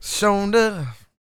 SING W, Vocal Planet Blues & Soul, BLUESY; ILIO

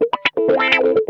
ROCKIN, Funk Guitar; 90 s Sample CDs; Zero G

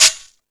Percussion FX, E-MU Pro-Cussion

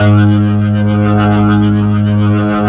G#, The Piano; InVision Interactive

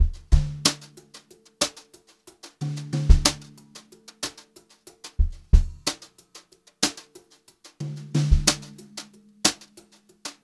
brushed drum loops; USB Soundscan vol.39; AKAI; 90 s Sample CDs

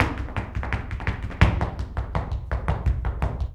Flamenco, ZAPATEADOSOL

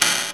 WINDER, percussive; Rarefaction; Poke in The Ear With A Sharp Stick

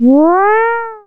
A#, FX; Big Fish Audio

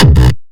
bass kick

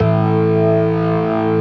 STOPS, Synth and Organ

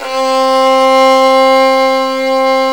VL TEN C 3-L, STRNGS

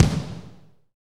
KIK AMB, kicks; AMG; Now CD-ROM (Roland); NOW! Drums

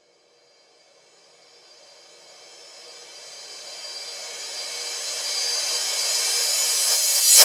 Reverse Cymbals

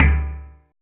SOLENOID, FILM PERC; Drums In Your FACE!

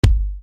Bass Dr, EuroDance Bdrums

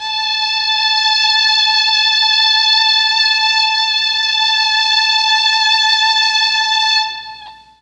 A3 3 violins, Mellotron MKII; Mike Pinder - Mellotron Archive CD

